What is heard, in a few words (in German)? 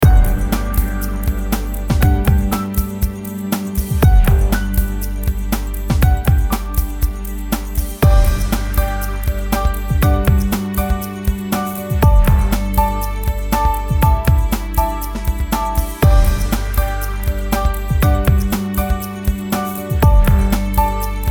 Warteschleife, music on hold Warteschleifenmusik; Lebo